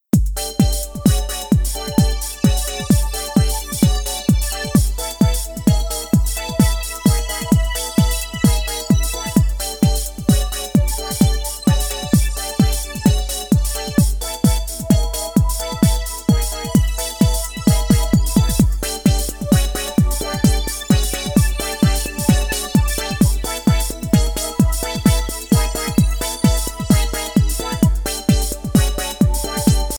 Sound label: off melody